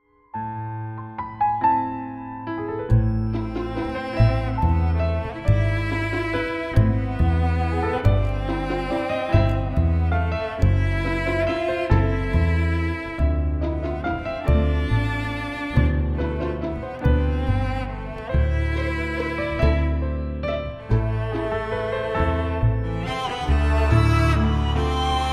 Romantic classical pop; Romantic classical pop promo; MIUI12

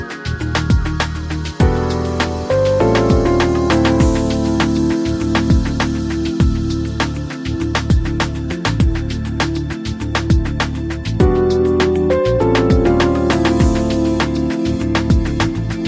Lounge Smooth Jazz Background; MIUI12

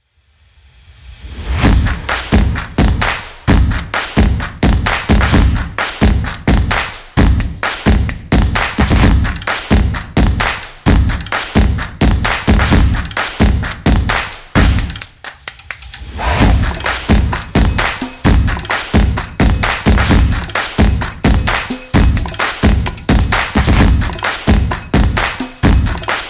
Drums; Rhythm Rainbow; MIUI14 and HyperOS

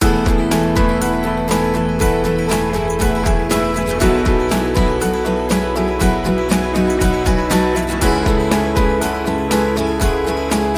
Positive Commercial; MIUI12

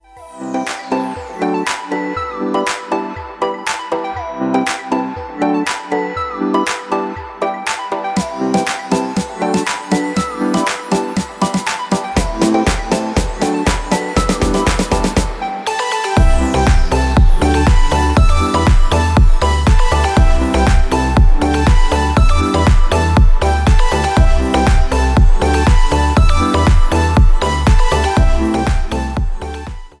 Electronic pop; MIUI12

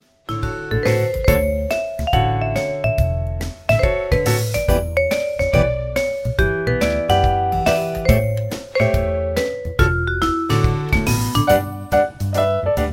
Pop Rhythm, ringtone; MIUI12

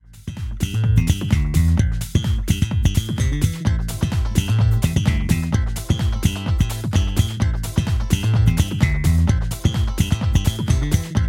Funk, ringtone; Overweight

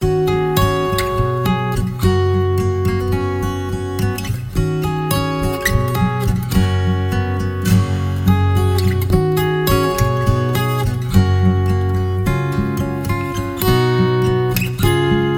Acoust Uplifting II; MIUI12

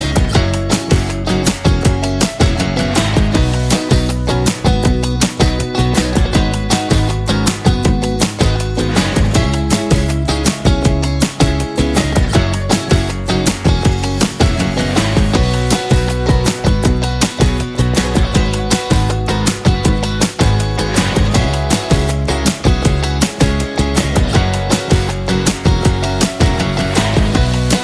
Upbeat Fun

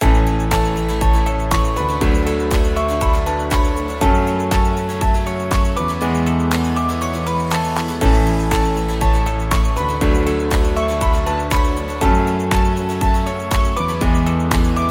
Background Motivation

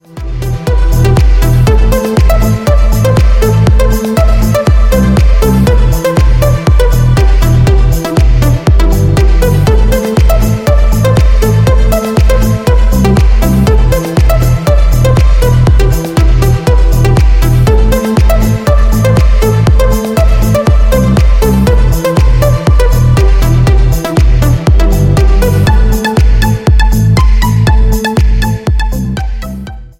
Expansive Ambience; MIUI12

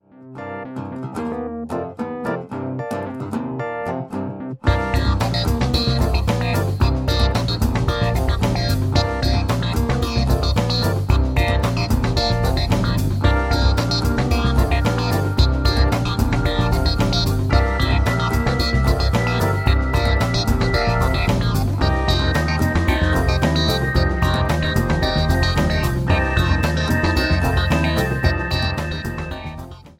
Funk; MIUI12

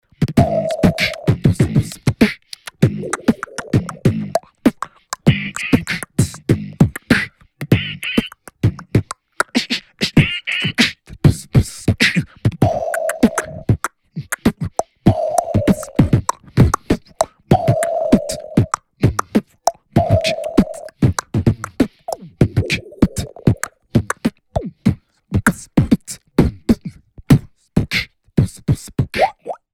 beatbox; MIUI12

